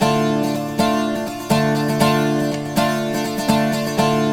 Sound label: Blues Guitar Samples; ILIO; Fretworks